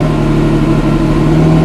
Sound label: IDLE, vehicles; aronwk collection; ndaudio